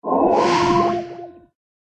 Water Spirit

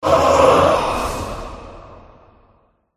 boss death; eldive

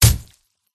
forest keeper attack contact mid

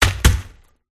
werewolf attack contact bottom

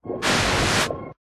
Water Spirit Dodge

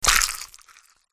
crab wound

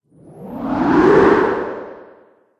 sea monster

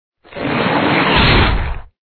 pet armadillo

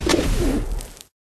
Pigeon Die; Pet

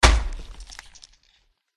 dead pirate boss attack contact bottom

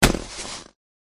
snowman attack contact middle

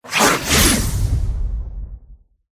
cat death

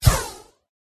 mage female attack contact up

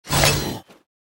Legend Dervish Block

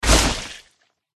attack contact up

